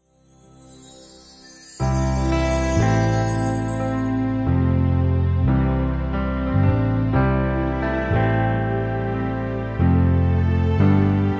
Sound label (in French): instrumental